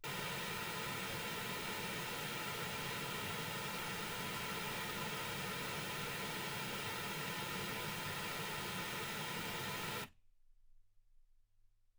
pink noise 48kHz

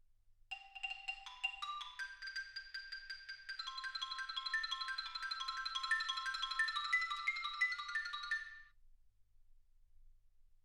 xylophone

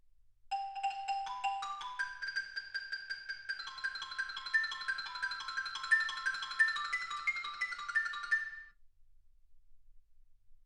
xylophone

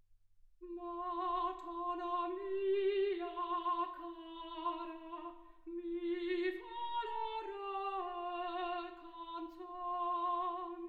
soprano

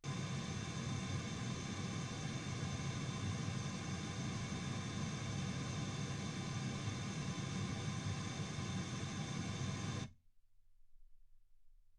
pink noise 48kHz

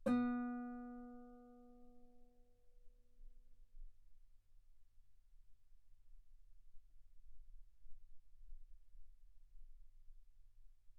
Guitar ff B3

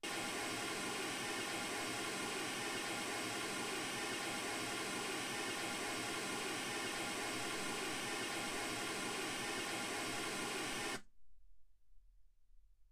mls 48kHz

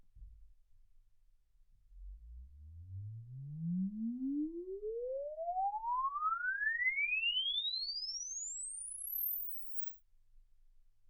exp swept sinus 10Hz 24kHz

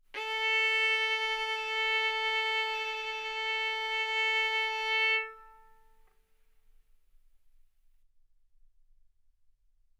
Violin arco A4 B4; mf; sul A; stereo

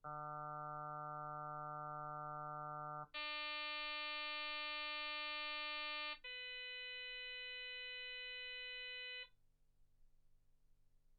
harm sinus 48kHz

